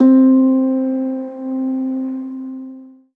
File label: KEYS Taped - C; Synth One Shots